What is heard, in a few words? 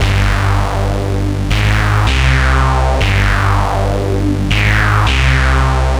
Distort Bass; Sonic Foundry (Sony Creative Software); Knutrix Drum & Bass